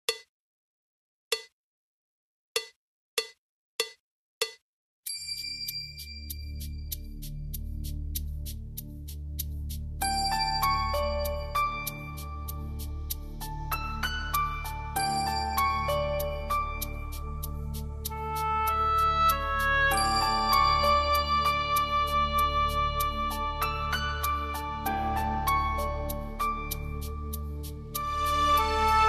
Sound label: Illusive Rain (Female); bollywood; Rockin Meera (2009)